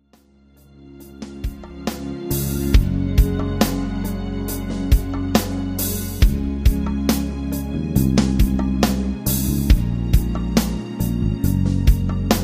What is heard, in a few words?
rhythm